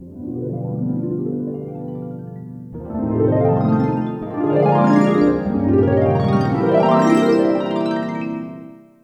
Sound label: Jingle C